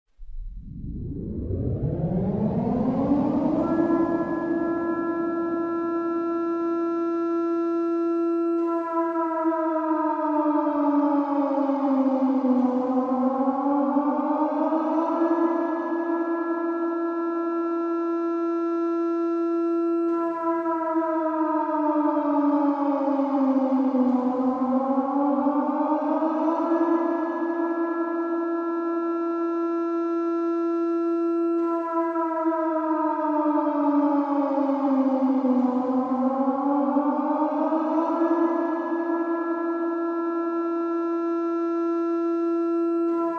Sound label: Siren Alert Alarm sound in the WAR